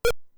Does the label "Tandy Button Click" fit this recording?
Yes